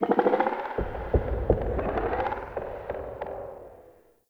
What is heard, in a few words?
Root Cave Trippy Knock; Ambience; One Offs; Neathuns Root Cave